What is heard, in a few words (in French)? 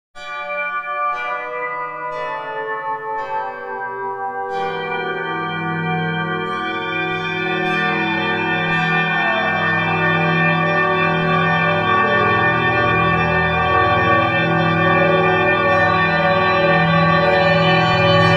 exemple bells; physical music; time freezer